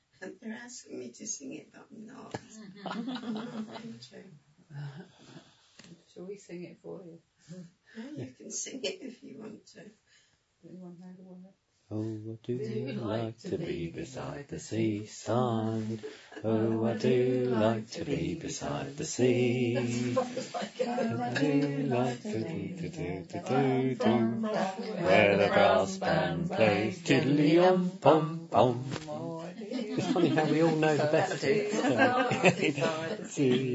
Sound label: singing